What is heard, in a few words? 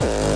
obnoxious kick